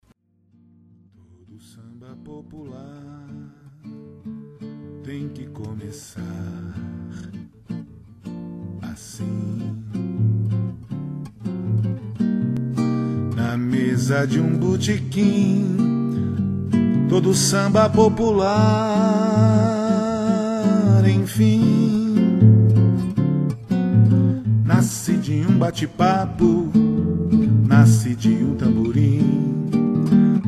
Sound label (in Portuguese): samba popular viola